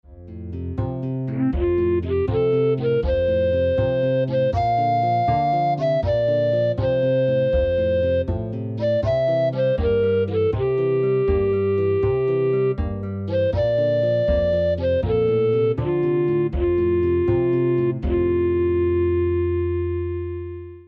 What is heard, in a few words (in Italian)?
base, canzoni religiose; razioni assunta